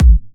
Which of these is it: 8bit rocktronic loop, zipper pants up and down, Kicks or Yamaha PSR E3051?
Kicks